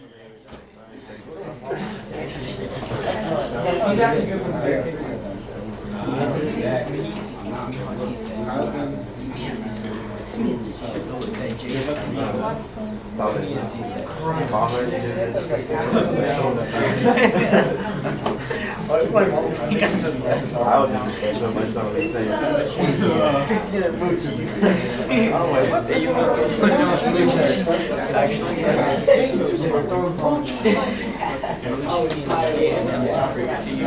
Classroom Ambience High School Class

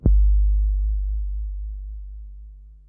Ultimate Subkick

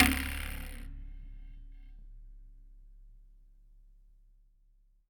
Flick

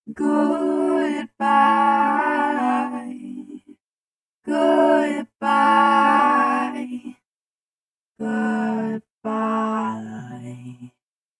Choir Goodbyes

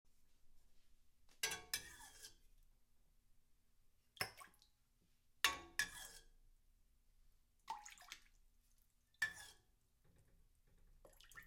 Ladling Soup

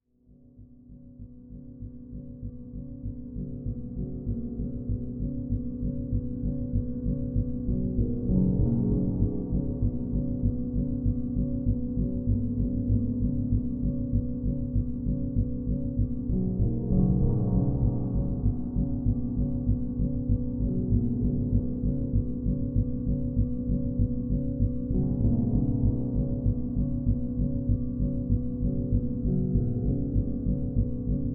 Reso Rhythm

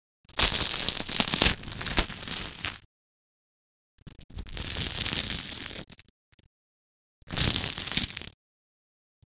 Breaking Bread

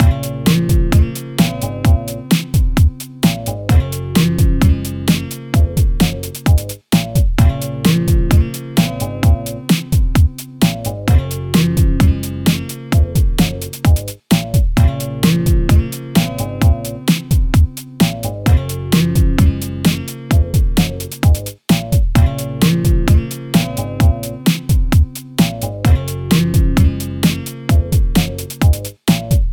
Pop Music Loop

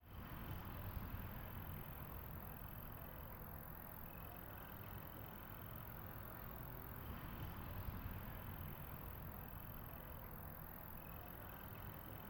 Night Time Ambience

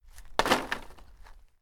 Dropping Wood

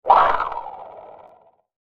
Boing

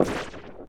A Shot

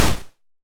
Mega Chiptune Snare

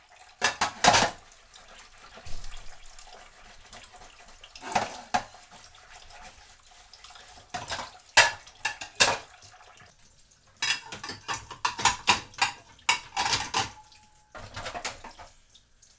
Doing The Dishes Plates

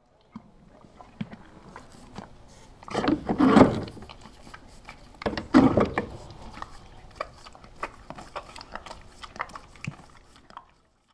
Redbarn Horse Saltlick

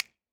Snap

